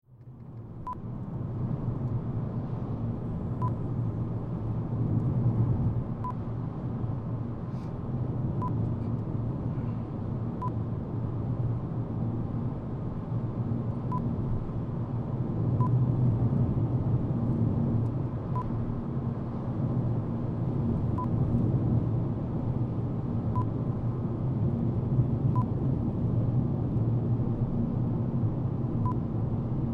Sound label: Fiat 500 Drive Interior